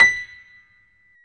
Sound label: PIA GRDPI, Roland